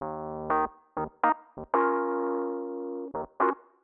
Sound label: 125 Rhodes C; Keys; PowerFX - Nu Jazz House